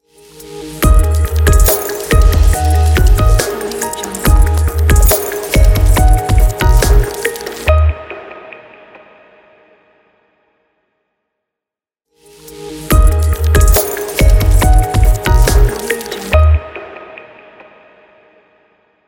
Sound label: digital logo